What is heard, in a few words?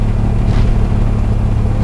mech slow, vehicles